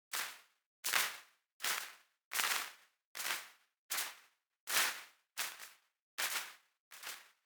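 synth high grass, Physically-informed sonic models; The NIW Project; NIW: Natural interactive walking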